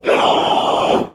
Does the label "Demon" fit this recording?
Yes